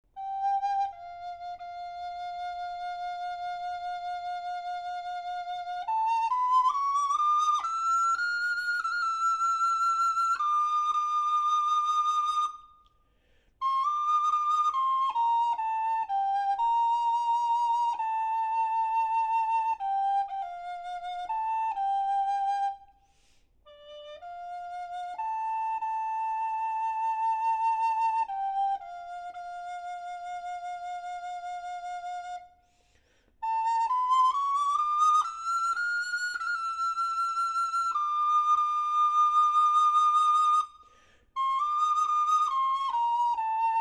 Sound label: ocarina; Suzuki PULUMA